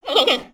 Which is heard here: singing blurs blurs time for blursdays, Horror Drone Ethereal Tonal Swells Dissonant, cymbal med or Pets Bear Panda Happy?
Pets Bear Panda Happy